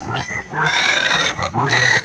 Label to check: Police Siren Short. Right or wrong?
Wrong